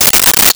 Sword Swipe, Weapons; 1001 Sound Effects

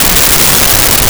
Pink Noise Loop; Test Tones; 1001 Sound Effects

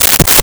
Duct Tape Tear, Foley

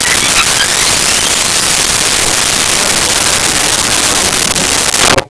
UFO Sweep; Transitional Elements

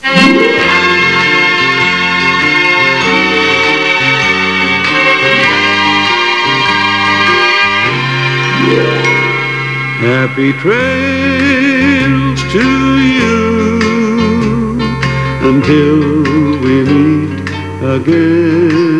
happy

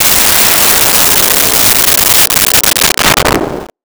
Missile, Weapons; 1001 Sound Effects

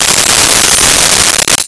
CHIMES

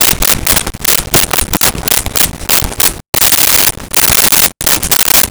Write on Cardboard with Marker; Office Supplies; 1001 Sound Effects

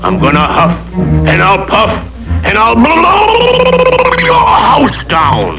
huff puff; LOONEY

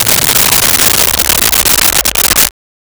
Dub Sound; Virtual dj sound effects and plugins

